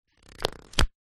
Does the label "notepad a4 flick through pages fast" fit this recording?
Yes